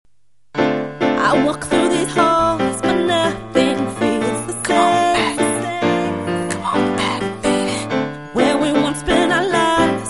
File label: piano